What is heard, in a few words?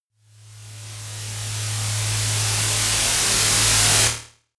noise sizzle long, risers